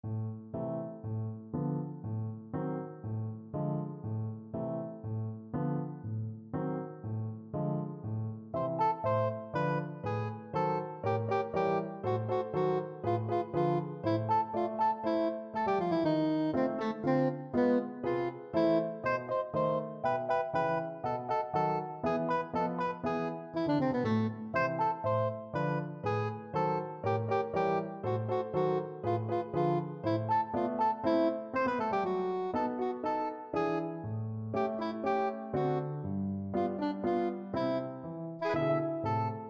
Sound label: sugar plum soprano saxophone; tchaikovksy